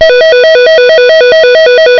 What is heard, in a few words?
LAZER